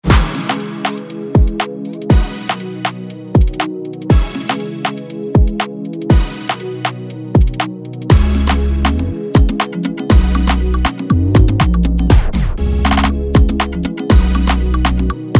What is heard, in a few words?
INSTRUMENTAL